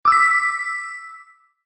success

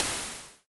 snd Splash; zj the ball

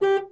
car avoid car horn; 2fast2curious

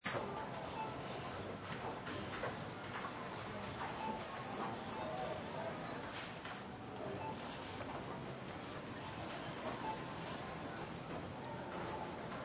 grocery

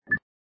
beep

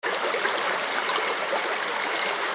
water; anno-1404

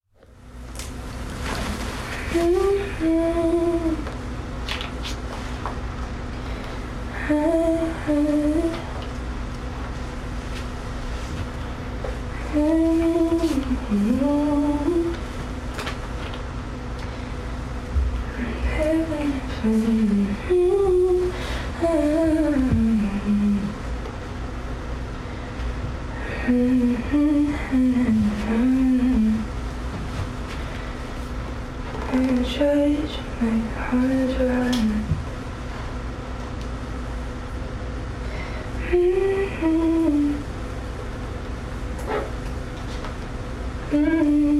skit humming

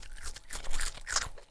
eating; halloween

